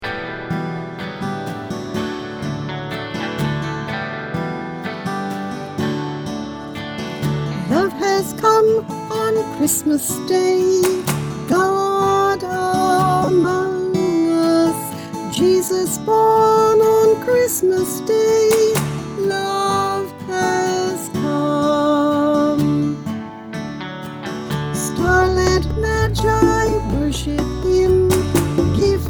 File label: VOCAL D to E flat